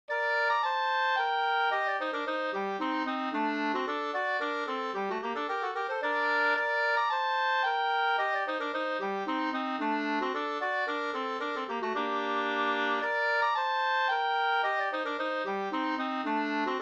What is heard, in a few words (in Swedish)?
slangpolska